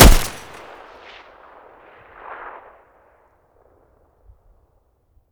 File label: mg muzzle mono, weapons stationar